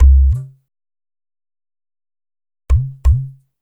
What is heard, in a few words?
SWT WAVEDRM, SWINGTOMS; Spectrasonics; Liquid Grooves 1 & 2